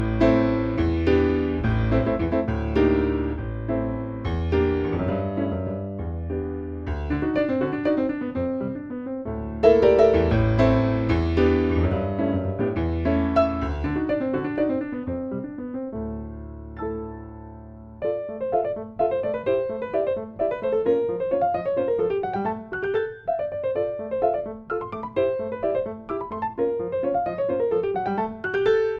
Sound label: waltz; FAmirov